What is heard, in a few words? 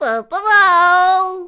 Jump; Killer Bee #2 jumping